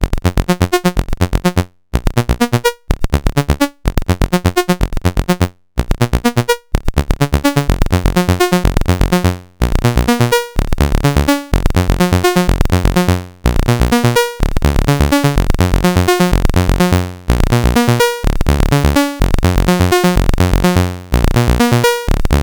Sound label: SAWTOOTH pattern